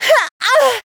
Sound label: Voice attack; pom